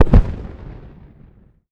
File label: IED Explosion Far